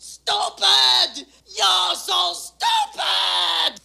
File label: game lost sound; Drone Invaders; Turtle